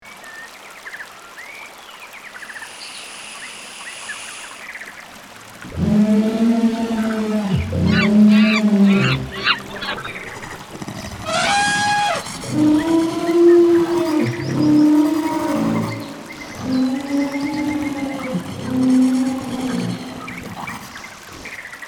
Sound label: forest ambience; Forest & Wilderness